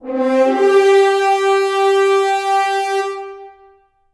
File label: Go Battle